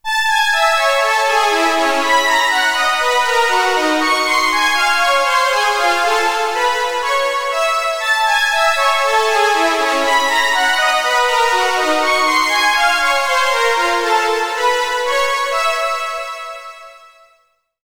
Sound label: STRING MACHINE